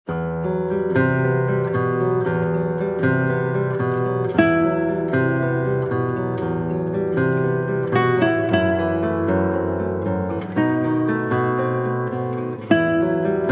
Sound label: milonga